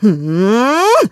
Hmm; The Lady summoning her pets (Laughing, "Hmm...!", "Ooooh...")

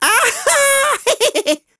Aha Heheh; Rupin: "Aha-Heheh!!"; shop owner; Skyward Sword; zelda

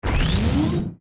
Goddess Fly Up

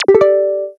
Warning; Pirate Ship

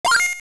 Menu Letter; Select a letter on the name-entry menu

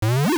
Pick Up; Link picking up an object; Zelda Oracle

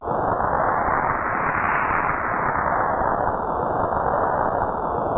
Wind Mono; Zelda; LTTP

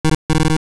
Error; Error sound (also used when Kinstones can't fuse); Zelda

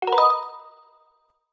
Prompt Appear; A dialogue prompt appears; Skyward Sword; zelda

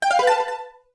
Pause Menu Save Yes; Save your game (Recorded)

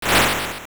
Shoot Energy; Second form shooting an energy attack (uses Laser sound when reflected); NightmareShadow2

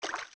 Steps Shallow Water; Zelda; OOT

